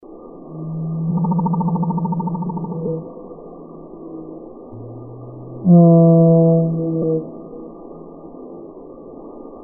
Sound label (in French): baleine bleue; Blauwe vinvis; WWF Rangerclub